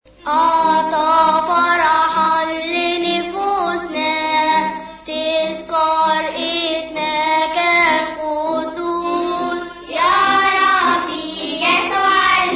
Epsaliat el sabt arabic; Tasbeha; Tasbehet Nesf Elleel; ebsaleiat; saturday

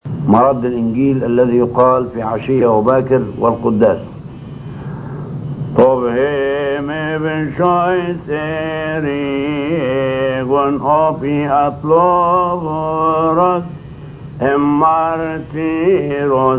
Gospel Response; Alhan Shohada; Martyr Tadros

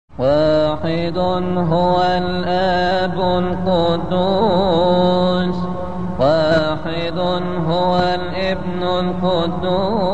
amin espatir (small) arabic; kodas; raf3 elhamal; deacon; espateer

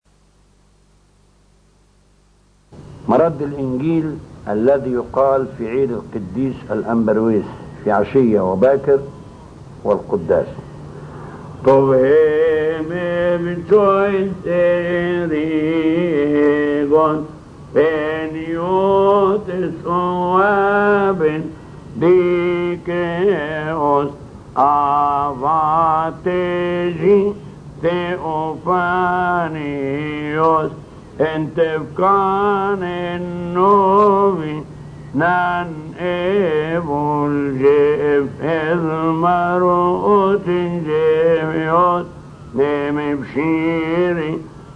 Gospel Response; Alhan Kedeseen; Saint Rewais

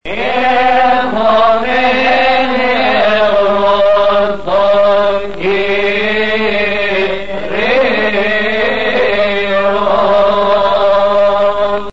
Ekhomen Pros, Chorus; kodas; khoras; OKipios responses; Maahad Faraony

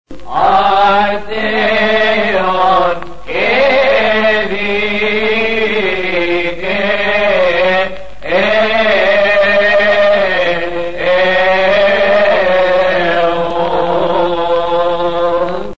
Axion Ke Zekewon, Chorus; kodas; khoras; OKipios responses; Maahad Faraony

